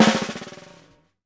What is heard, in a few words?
PRESS LOOS, CHRM TAMA; Sampleheads; New York City Drumworks VOL-1